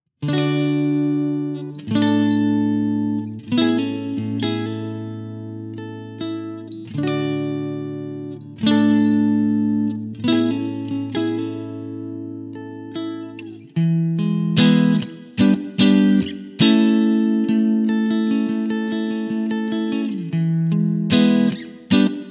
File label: clean; jensentone